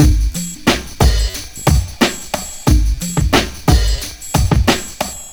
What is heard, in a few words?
bucket beat